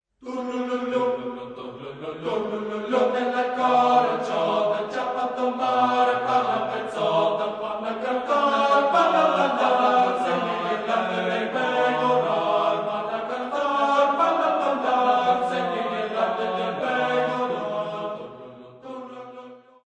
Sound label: Boys' Choir; Sounds Colours; Turlululu